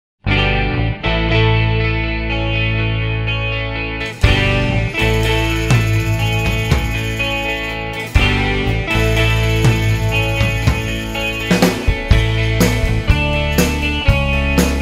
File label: Flower Child; Soundzabound Volume 5 Disc 3